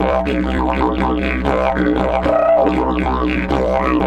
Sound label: 120, Didgeridoo